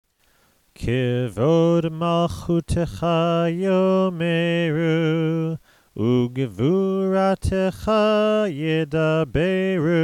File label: ashrei